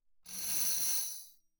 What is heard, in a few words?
tambourine finger roll ff; Percussion